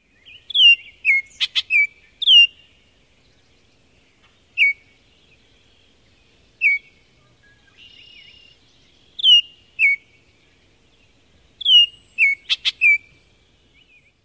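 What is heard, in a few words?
songs and whistles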